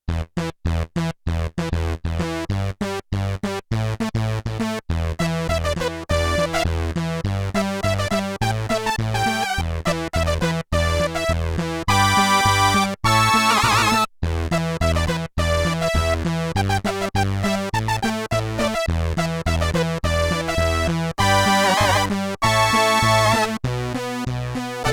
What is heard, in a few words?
Syn Bass Prophet; Yamaha TX16W